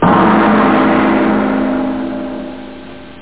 gong; term; ring

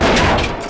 tank hit; Panzer

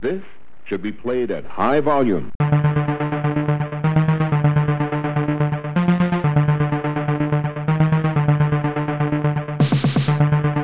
techno slam; OctaMED SoundStudio V1; mug-members; tom cozzolino

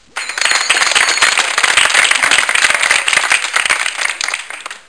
Applause Only Medium Crowd

